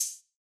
Hihat Open Gm Hit Analog

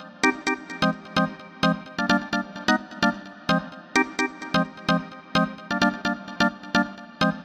Synth Loop 129 Cm

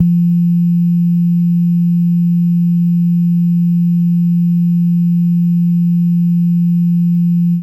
Pocket Piano A1

